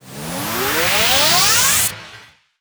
JINGLE battle; beyblade x xone

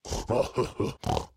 Random Laugh; Aporkalypse Pigs of Doom

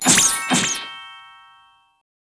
Snail disappear

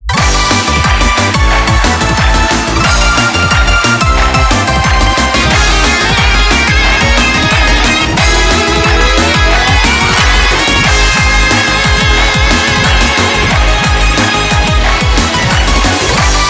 Bgm Casting; Mahjong Fight Girl (Arcade) (gamerip) (2023)

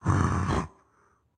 Dash Attack; Caffiend Caelan; Going Under

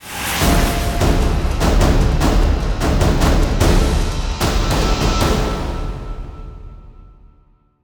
Stinger Fill

